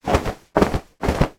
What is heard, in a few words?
shorts shake 3 times; foley